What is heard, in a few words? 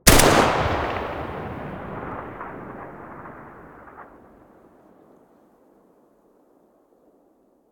galil muzzle mono